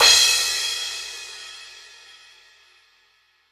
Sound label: Crash